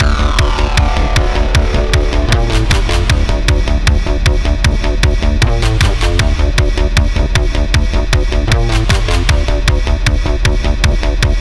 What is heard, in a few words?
Pursuit Loop Full; Spartaga